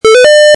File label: dart board sound; autodarts